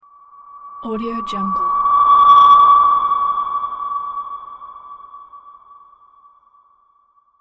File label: swoosh high pitched